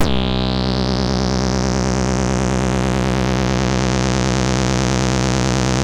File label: Synth and Organ